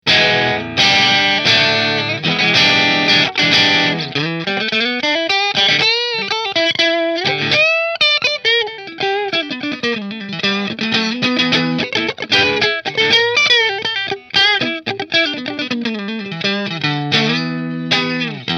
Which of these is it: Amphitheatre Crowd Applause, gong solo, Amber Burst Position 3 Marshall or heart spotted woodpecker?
Amber Burst Position 3 Marshall